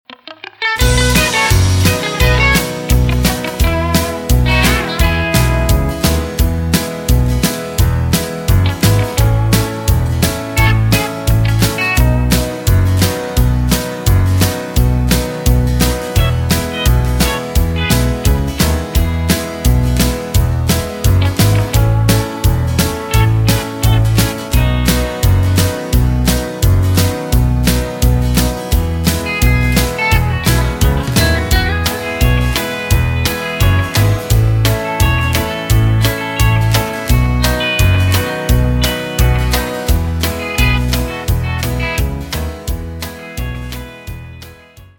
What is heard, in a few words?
(E) No Backing Vocals